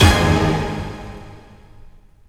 FX; AMG Megabass REMIX! VOL-2

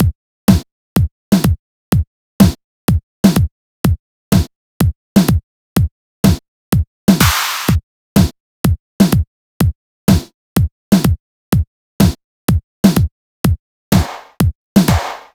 Kick Snare; Afterhours EDM; Electro Shock; Sonic Foundry (Sony Creative Software)

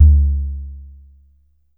Drum X Sub, Pearl Drum-X

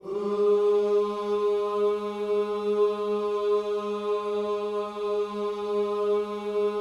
OOS G#3, GOSPEL; Spectrasonics; Vocal Planet

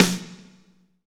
SNR, Snares, Roland

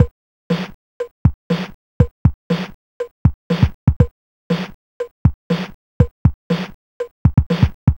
Apple II Rock Beat, Drums, Chiptune; Sonic Foundry (Sony Creative Software)

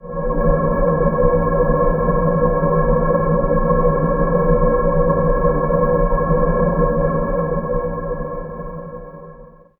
pillar drone C#, ancient drones; Zero-G Seismic Frequencies; 90 s Sample CDs

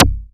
KCK, Yamaha TX81Z synth drums

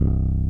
ELEC. PIANO; OMI - Universe of Sounds

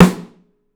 Drums, STEEL; 3D Drums

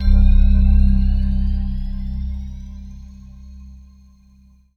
synth bell; TS 10 TRAN

